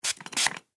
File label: Household Hairspray Bottle Pump; Bathroom Sound Effects; Bathroom (Sound Effects:Household); SFX Bible 6